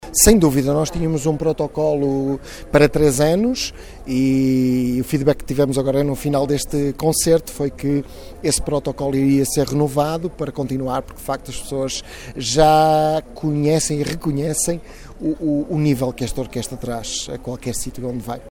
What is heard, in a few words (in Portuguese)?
concerto bandolins